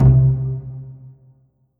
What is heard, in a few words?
Roland JV-2080 Bass Pizz C2